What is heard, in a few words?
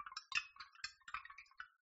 compost debris; marie-eve-levasseur